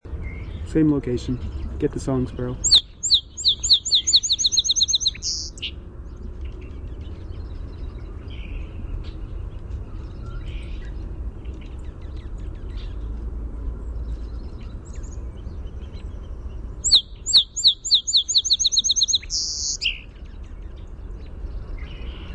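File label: Song Sparrow song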